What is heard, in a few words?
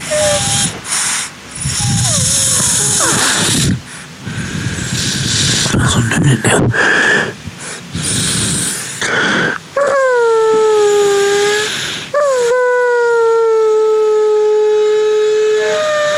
nose noises